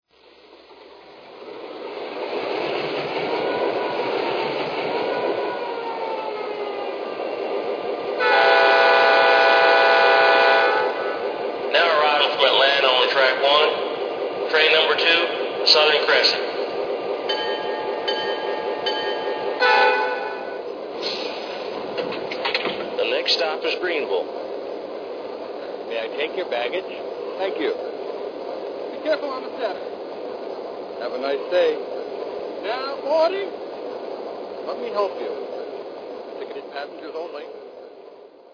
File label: E8